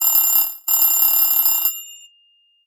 Analog Alarm Clock; Samsung Galaxy Watch 8; ringtones

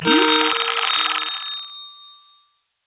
incoming call; Netgear; SPH200W; Skype WiFi Phone

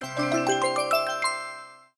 Scale, notifications; Vivo X80-Pro

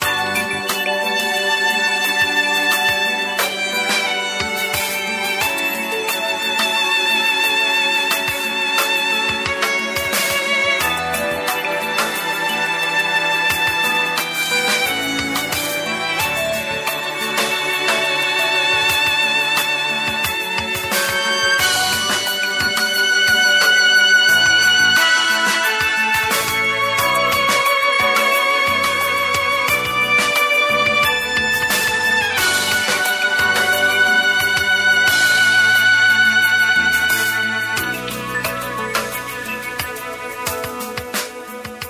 Autumn Wind; LG LV7500 Whistle

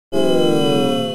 Fold close; Nokia N92

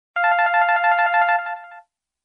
Old Ring; LG; CU500

